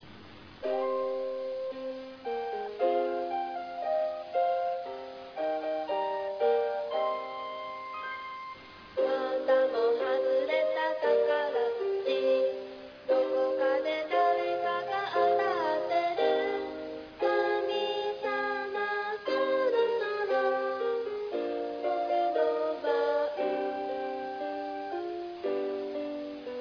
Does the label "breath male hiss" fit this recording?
No